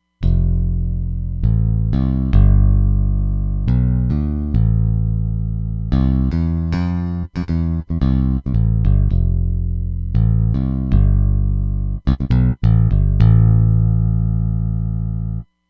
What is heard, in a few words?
Nu Pick Bass